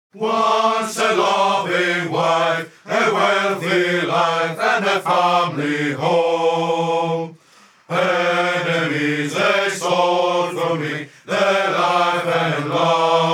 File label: choir, sea shanty version